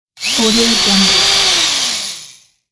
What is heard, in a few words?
drill